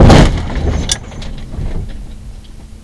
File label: impact car light; Kart